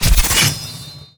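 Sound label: attack falchion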